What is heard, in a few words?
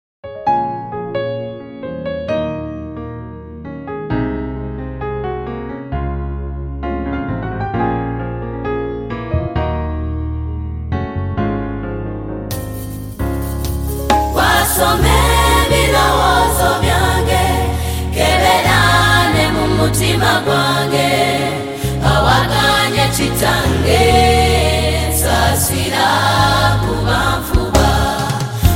Ndi Wuwo, Choir; Streams of Life Choir Kennedy Sec School